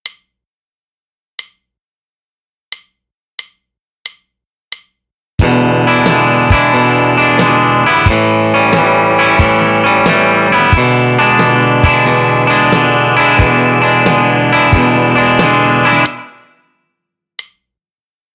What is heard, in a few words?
Build Up